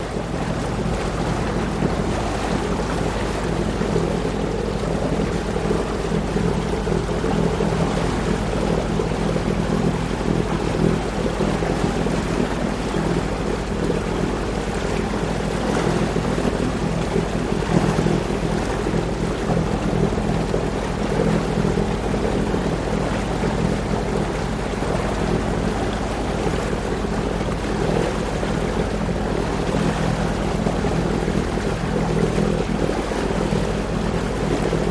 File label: Boat Exhaust Gurgley